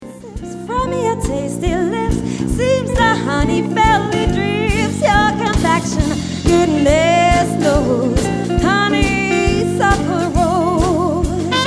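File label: Sextet (vocals, trumpet, guitar, guitar, bass, drums)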